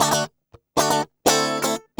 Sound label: GUI 120 5bD; Best Service Gigapack 2